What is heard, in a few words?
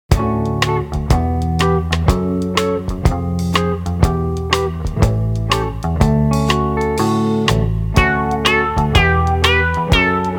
Little Elf Instrumental